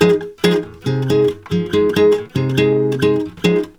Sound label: F MIN, Brazil Bossa; USB Soundscan; AKAI